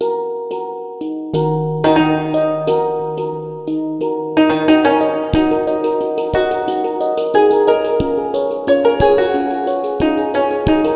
ringtones; LG Smart Folder X100S

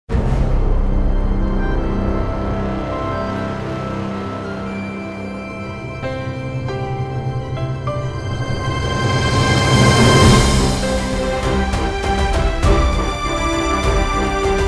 Epic; The Voyage variants

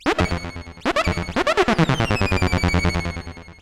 fx; Google; NewWave Labs

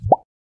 Email Sent

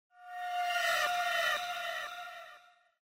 Power On; KF900 Prada II

